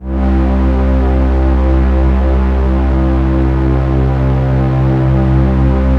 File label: ms20 noisy stringus; MS-20 layers